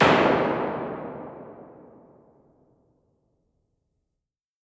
EMT 140 dark, Plate; IR

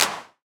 clap, TR-909; Detroit

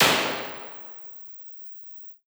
EMT 140 bright; IR; Plate

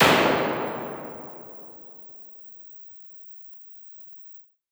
EMT 140 Plate

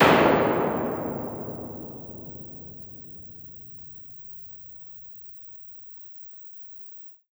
EMT 140 dark, Plate; IR

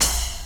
splash, pearl master studio; drums

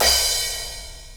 crash; drums; pearl master studio

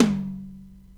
tom, pearl master studio; drums

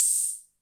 cymbal, CR-78

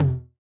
tom h, TR-909; Detroit

